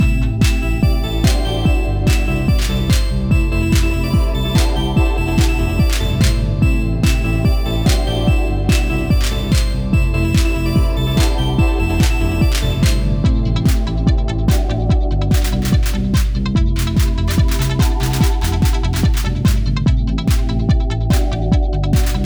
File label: search loop; DanmakuProject